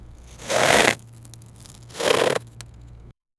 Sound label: sliding on ice tight sound